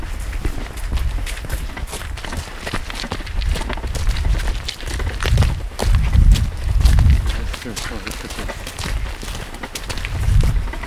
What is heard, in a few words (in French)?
AMBIANT livraison bouffe barricade chèvrerie